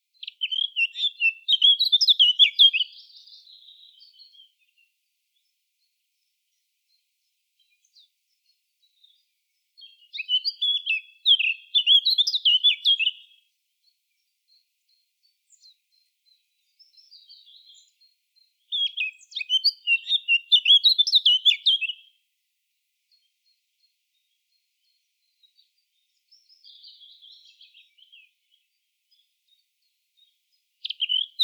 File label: Sylvia atricapilla; SETE